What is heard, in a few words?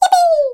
Correct; RepeatingPatternsGame